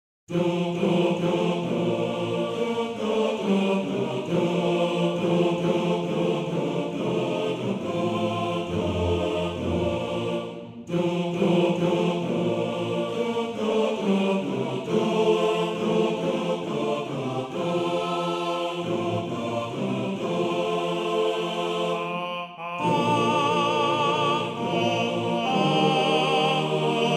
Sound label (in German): Chor